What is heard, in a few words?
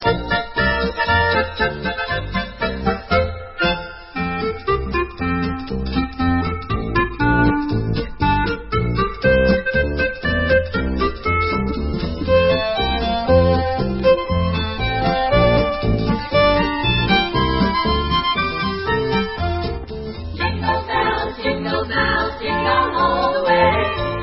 Jingle Bells